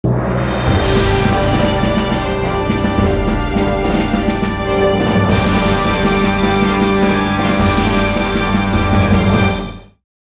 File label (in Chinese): 大气的背景音乐